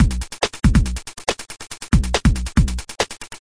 140 straight 16ths electric boogaloo, drum loops and breaks